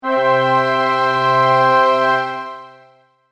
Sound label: Ta-Da Orchestral; Musical